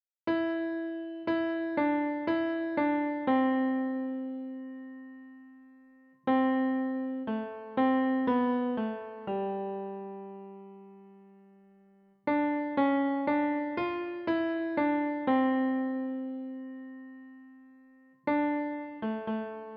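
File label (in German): Leise rieselt der Schnee Klavier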